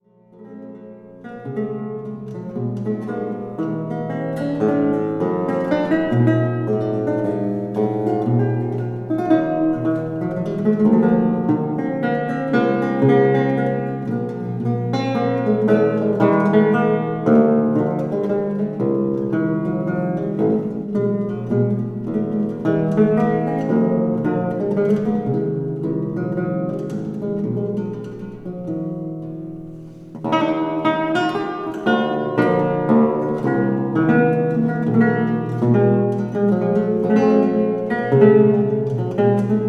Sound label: theorbo chacone